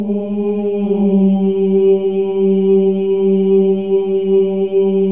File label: Voices